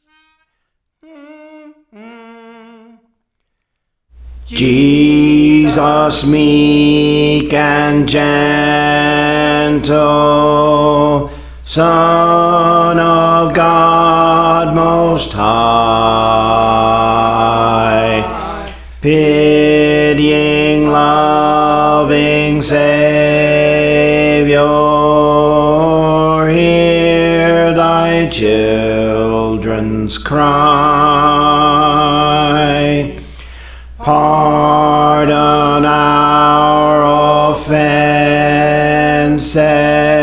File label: Bass; Praise For The Lord Training; Jesus Meek and Gentle